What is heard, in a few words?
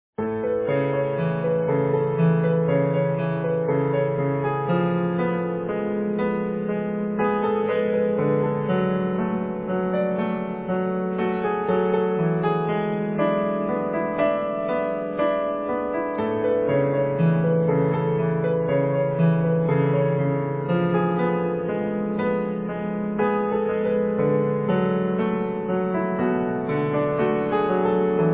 Easy Piano